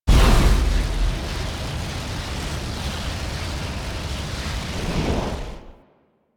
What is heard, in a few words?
flame vortex; Ambience; Props; Loops; Warframe; Eidolon Plains